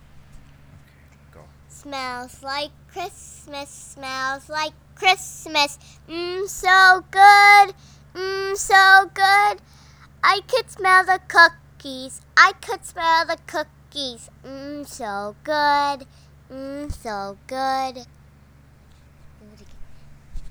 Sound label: singing class xmas song, preschool